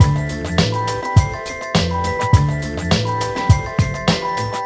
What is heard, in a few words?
sveney christmas